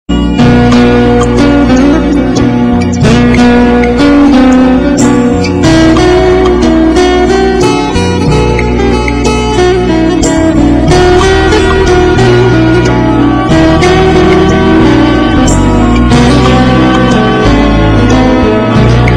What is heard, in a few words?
Arbi Violin